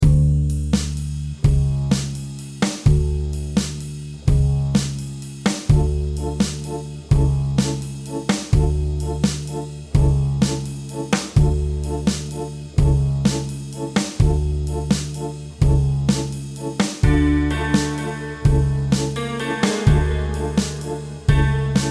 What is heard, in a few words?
Accordion